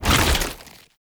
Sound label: Tank Infested Arm Move; Warframe; Lasrian; LasrianTank; Movement